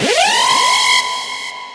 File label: Robot Alarm